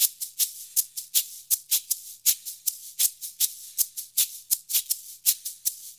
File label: 80 MIX, Percussion Loops